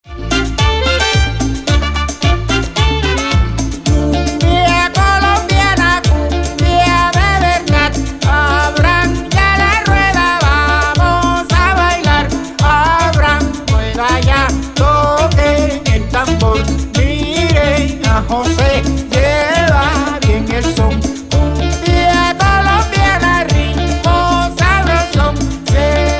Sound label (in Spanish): cumbia